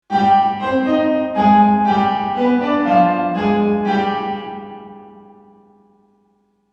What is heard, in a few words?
Piano Plate; Hardware